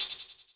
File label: pose